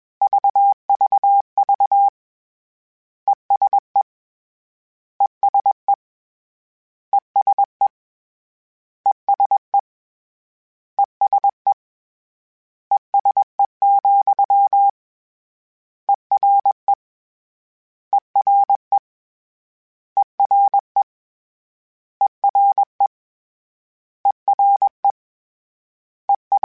CW Code Groups Suffixes, ESE ERE

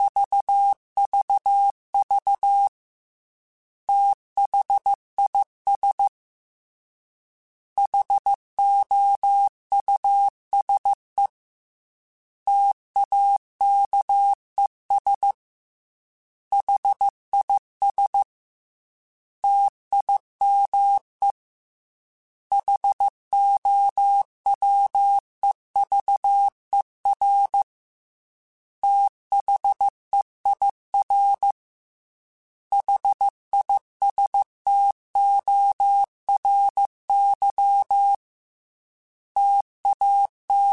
T then H Runs, Morse Code; HamRadio; Prefixes

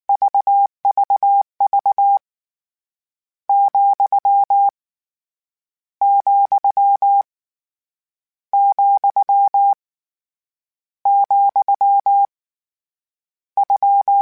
Morse Code, CW Code Groups; Mixed

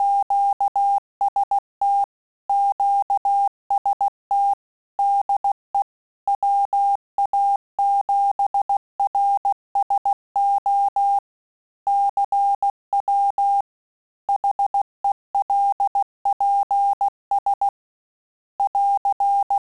Morse Code; HamRadio; QST de WA7RSO

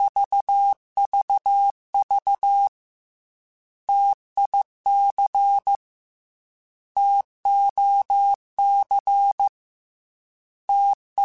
T Runs, Morse Code; CW Code Groups; Mixed; Single T or H; HamRadio; Prefixes

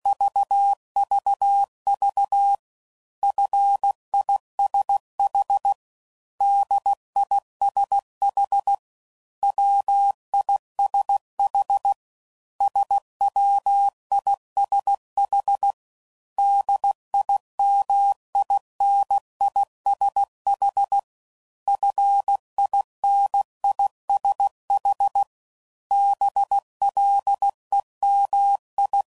CW Code Groups 15WPM, Suffixes; Morse Code

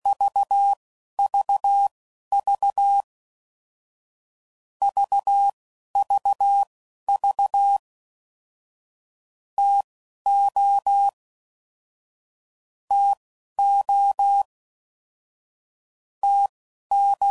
DIT & DAH Words; Morse Code; CW Code Groups 10WPM; Basic Letter Groups; Basic Sets

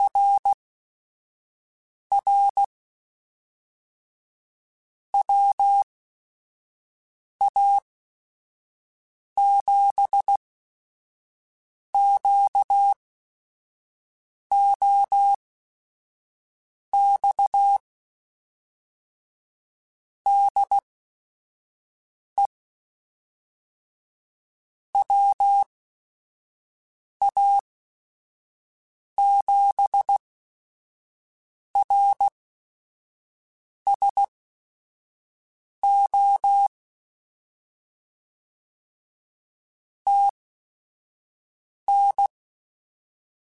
Morse Code, QSOs

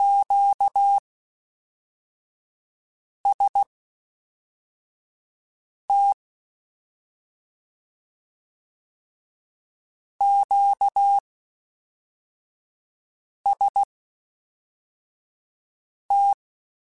QST QST, Morse Code; HamRadio

